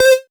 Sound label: Synth Sound; Vengeance Samples; VEE Synth Shots